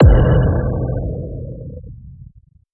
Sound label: impact spectral impact, transition; Polyend; Recue